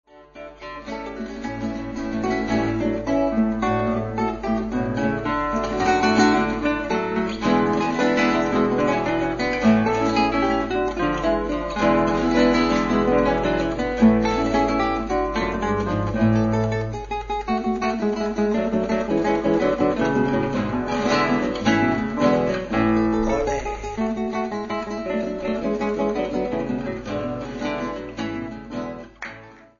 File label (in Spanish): trio de guitarras